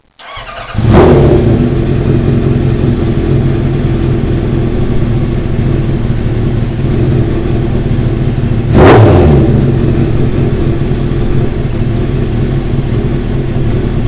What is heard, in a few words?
ZX10 idle, motorcycle